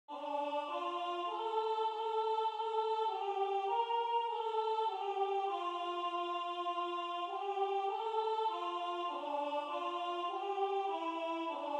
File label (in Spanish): Estribillo a 2 voces; Veni Veni Emmanuel; ADVIENTO; Adventus